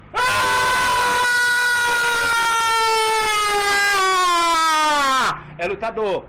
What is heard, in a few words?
aaaaaaaaaaaaaaaaaaaa; lutador